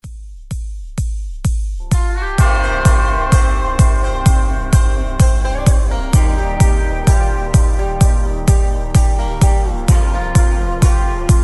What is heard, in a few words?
country edm